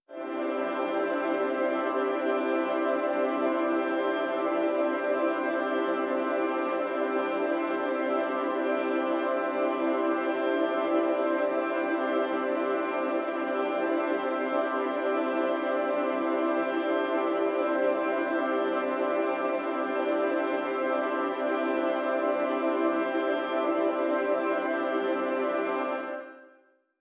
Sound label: random pad C